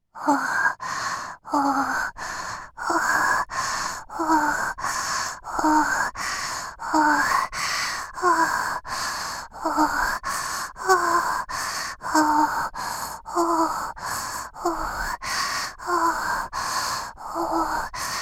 あ、あ、あ、あ、

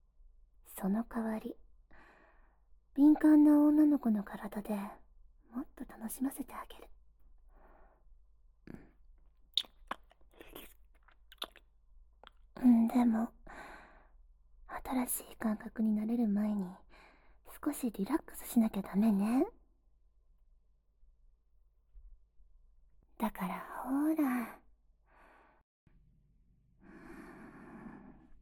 エロい耳舐め

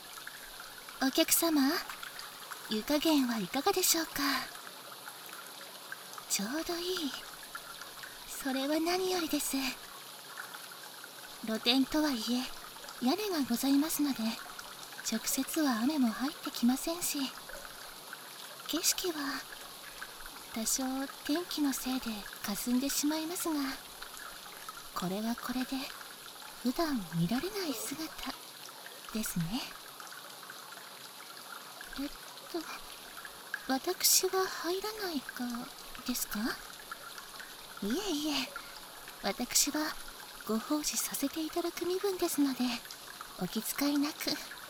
雨と露天風呂, バイノーラルマイク 効果音あり

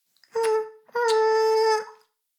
フェラ音声; 古き城のドラゴニート2

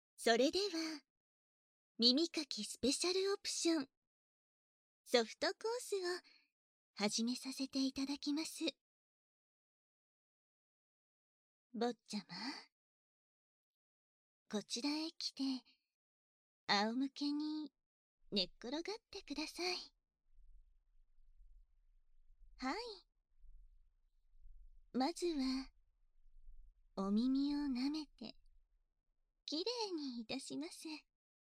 耳舐め手コキ; 基本サービス『耳舐め手コキ ソフト』

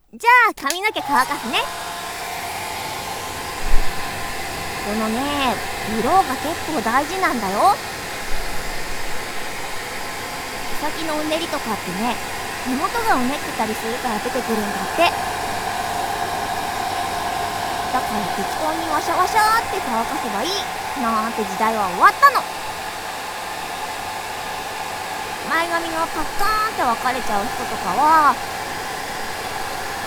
ドライヤー, モノラル 版; モノラル編集版

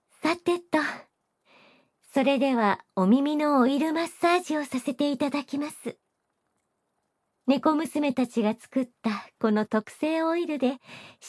オイル マッサージ〜顔・両耳〜; 猫神様特製のオイルマッサージ

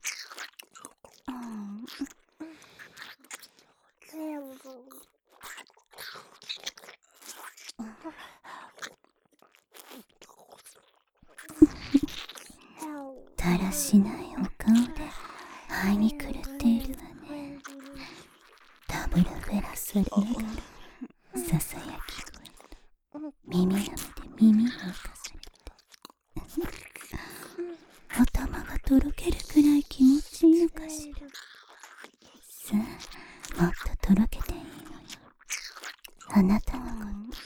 ループ 両耳舐め＆交互に言葉責め; 人外姉妹のダブルフェラ～囁き声と耳舐めで脳みそトロトロになるまで嫐り犯してあげる～; 妹すたー

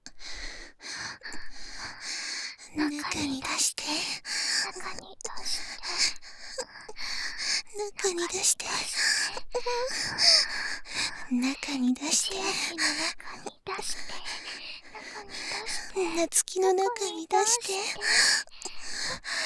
中に出して 喘ぎ; ハーレムオナサポ 4人のメイドがご主人様のオナニーをお手伝いするバイノーラル～文、千曜、名月、莉桜～

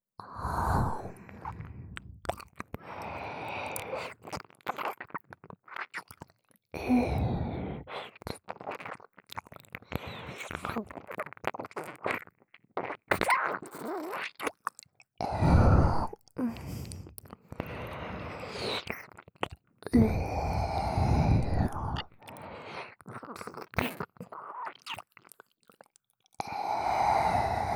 ねっとりとなぶる右, 耳舐め; おまけBGV

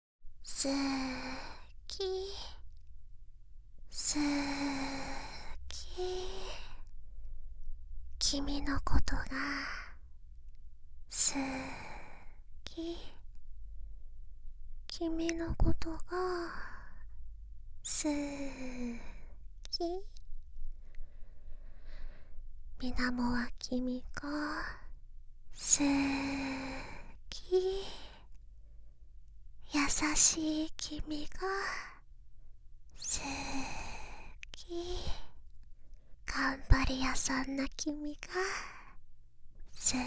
好き好き、ディープキス、耳フー、耳舐め, ASMR

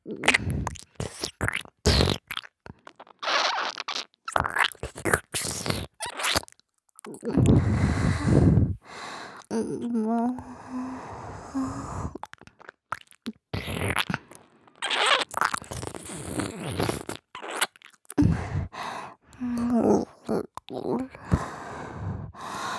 じゅずずずずぅ～っ, 左耳, ASMR

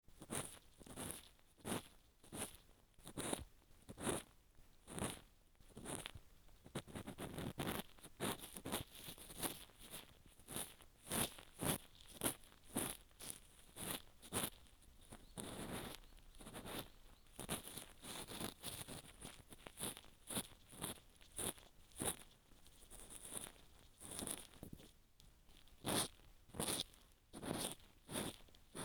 耳かきR（摩耗紙ヤスリ＋耳かき）; 夏実の耳かき

